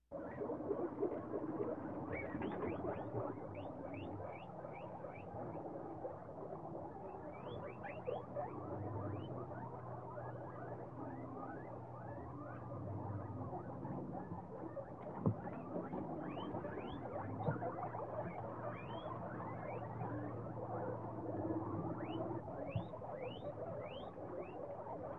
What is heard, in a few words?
Town ambience sound birds in the background